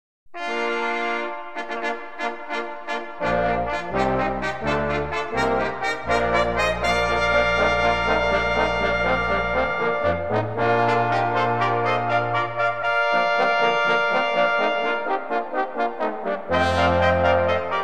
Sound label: Festival Fanfare